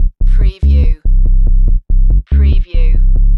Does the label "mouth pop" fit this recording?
No